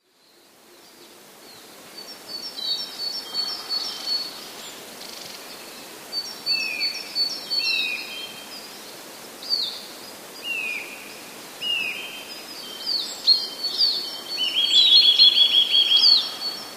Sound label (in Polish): kowalik szybsze tryle, szybsze tryle, które poprzedzone są kilkoma opadającymi gwizdami